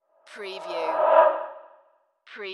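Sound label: Production Element Whoosh Fast; Sound Effects Bible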